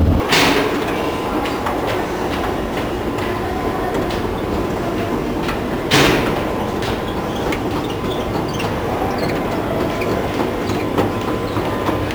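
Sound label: NY Grand Central escalator, interior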